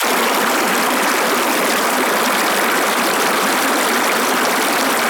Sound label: Foley Water; Loops; Ghosthack - Color Bass Essentials